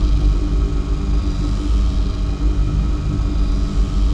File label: spy orb hum; Stellaris; utopia